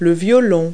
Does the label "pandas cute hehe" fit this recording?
No